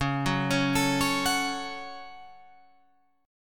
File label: Db Suspended 4th Sharp 5th Guitar Open F; arpeggiated